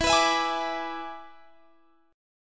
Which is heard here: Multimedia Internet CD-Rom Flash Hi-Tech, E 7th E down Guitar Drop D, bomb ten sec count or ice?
E 7th E down Guitar Drop D